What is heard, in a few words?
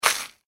human eat crunch toast; Human Bite/Crunch Toast; Eating; McKinney Sound